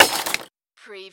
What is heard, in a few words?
CARTOON SHORT CRASH